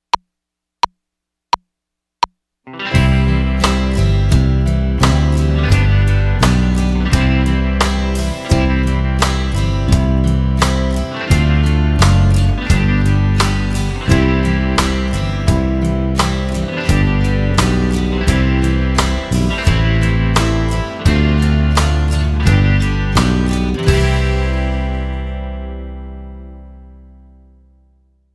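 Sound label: Major Scale Melody Without Guitar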